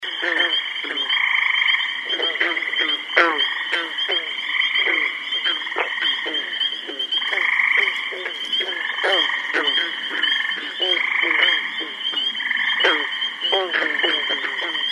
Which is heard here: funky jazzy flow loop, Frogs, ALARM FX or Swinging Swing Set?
Frogs